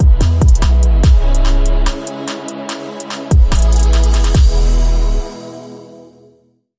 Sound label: Bumper